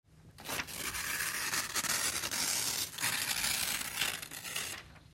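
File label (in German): Blatt langsam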